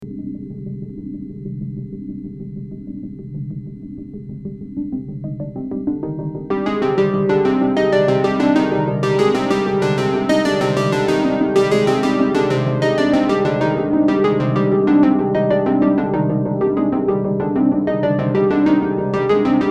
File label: JU-06A Sequencer